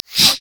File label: wraith effort; LoL; monsters